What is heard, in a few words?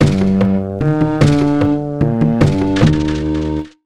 Sting Heat Wave; simanimals africa 2009 nds gamerip